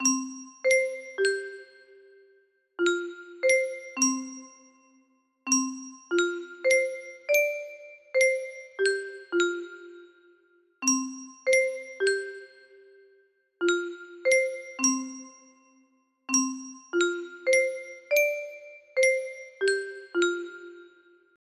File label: start menu; music box maniacs